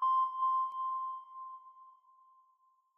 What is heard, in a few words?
ping in progress; ui